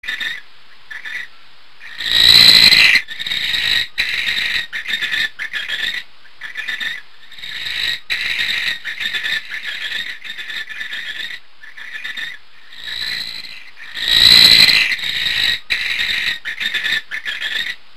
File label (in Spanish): canto de la lechuza comun